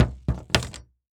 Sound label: Industry Machete Dropping On Hollow Wood